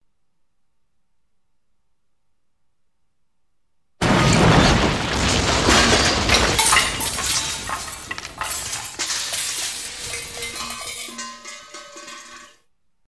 Impacts Crash With Paint Clanking Metal

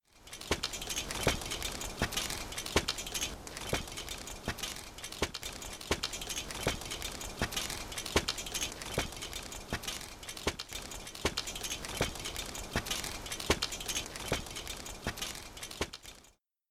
Footstep Armor Knight

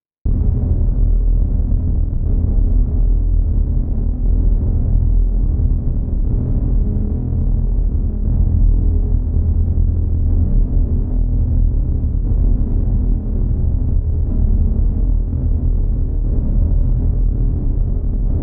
Deep Bass

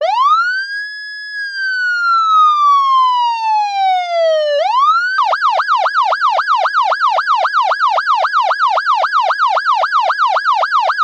Police Car Siren Synthesized Dry

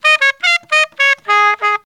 Sax fragment

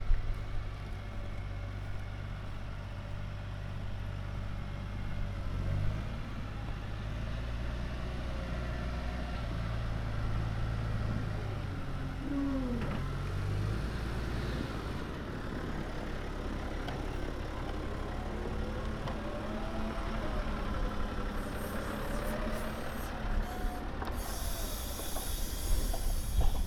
Tractor

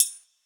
sword

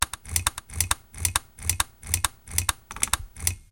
135 Bpm Loop

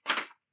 Paper Hitting Ground

